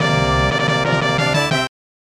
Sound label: Unknown Jingle; Downtown Nekketsu Baseball Monogatari; SNES